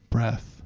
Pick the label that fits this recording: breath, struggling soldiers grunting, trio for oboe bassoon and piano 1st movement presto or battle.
breath